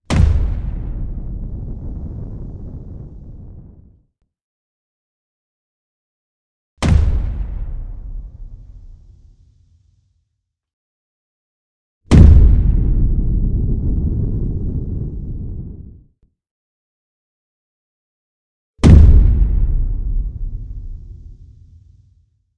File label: Bass Kick Explosion Drum; Yasomasa Or Akazawa Inspired